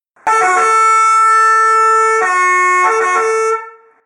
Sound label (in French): son tgv trompe metal, klaxon